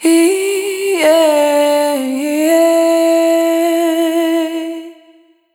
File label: Single Voice Melodic Loops